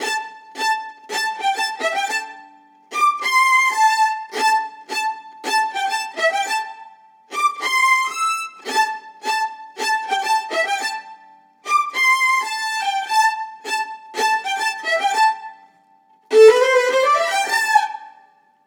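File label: 110 violin stack lead A minor; collie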